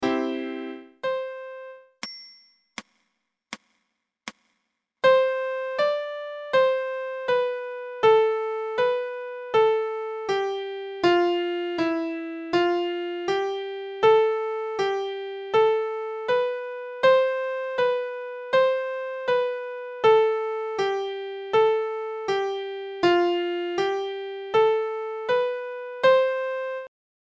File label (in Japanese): ピアノ音声